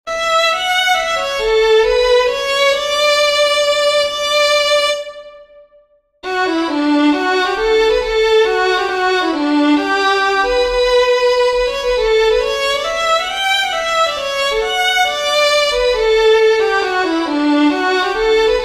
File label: OLD TIME WALTZ, fiddlers; WAYNES OLD TIME WALTZ